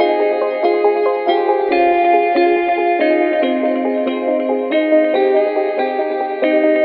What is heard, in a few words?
guitar, Loops